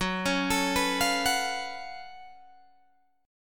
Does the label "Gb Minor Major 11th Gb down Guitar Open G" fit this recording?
Yes